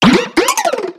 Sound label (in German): Ruf